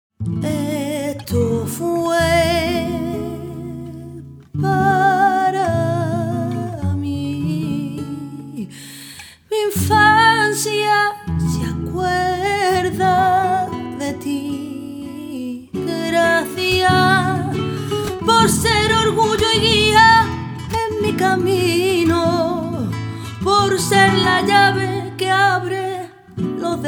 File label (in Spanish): Mini Flamenco